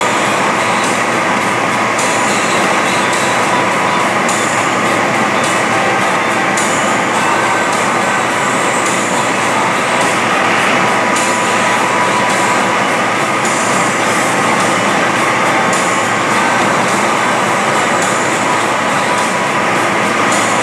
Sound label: car; canon mini x; Движение по трассе в автомобиле